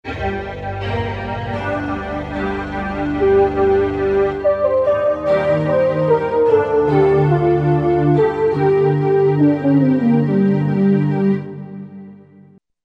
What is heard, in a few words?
Arco Horn, Korg MSC-11S Brass